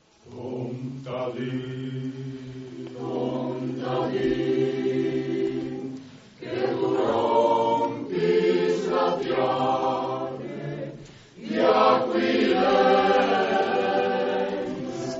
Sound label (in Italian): coro